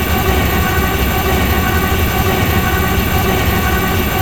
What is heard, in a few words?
landspeeder fly; gmod; star wars vehicles episode 1